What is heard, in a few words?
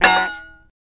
Bell Bonk Ring